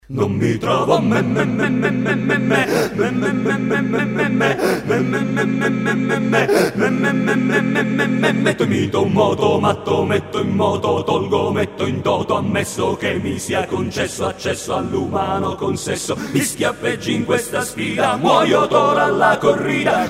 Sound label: Acappella